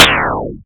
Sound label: pow